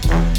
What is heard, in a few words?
Synth